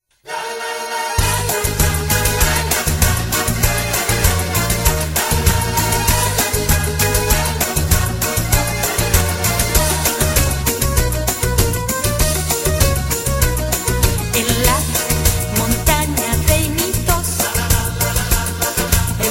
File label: samba Medley